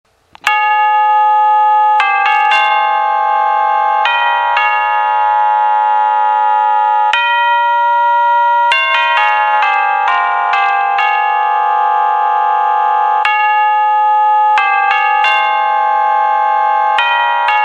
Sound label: Quad Ave Maria